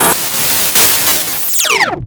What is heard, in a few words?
Static; Blastwave